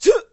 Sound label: Boxing Male Punch Grunt